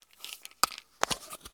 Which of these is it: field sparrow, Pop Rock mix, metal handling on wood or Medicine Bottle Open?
Medicine Bottle Open